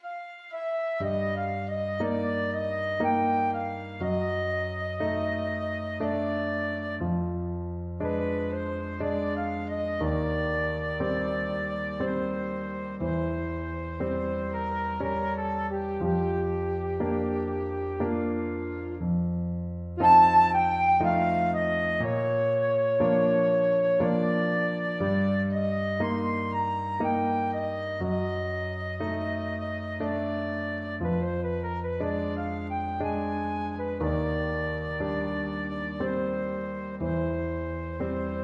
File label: waltz, flute